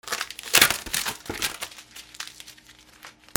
RIPPING

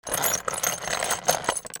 INDUSTRY

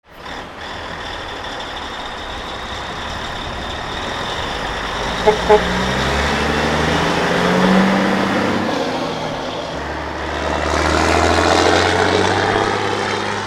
VEHICLES

